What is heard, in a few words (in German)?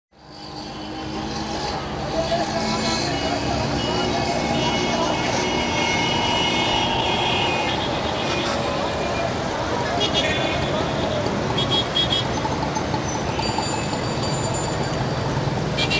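Rawalpindi Straße; Pakistan; RPindi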